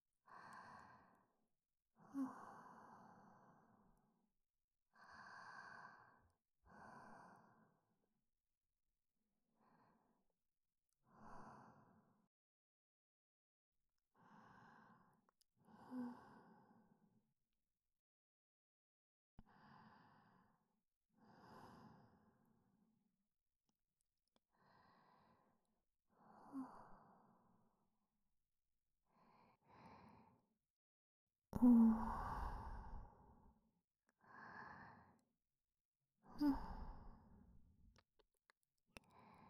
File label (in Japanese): 左吐息のみ